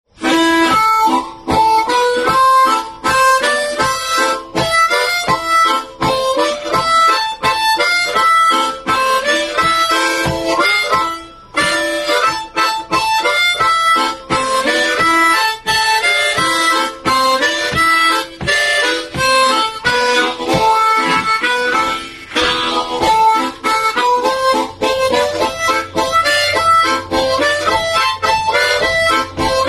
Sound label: harmonic minor A, harmonijka; rodzaje strojow harmonijek diatonicznych